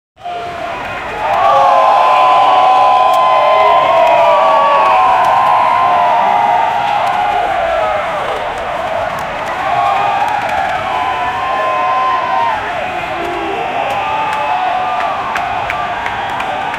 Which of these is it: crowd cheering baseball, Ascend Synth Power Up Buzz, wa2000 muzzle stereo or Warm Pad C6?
crowd cheering baseball